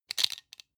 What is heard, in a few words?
plastic frame small hit rattle